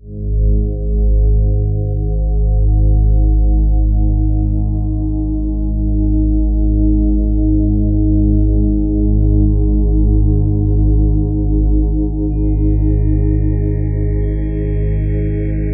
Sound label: chimes, Ominous Drones; LOWTONES; USB Soundscan vol.48; AKAI